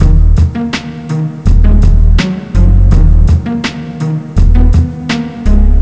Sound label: site loop lofi